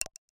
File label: Lock, notifications; Yota Yotaphone-2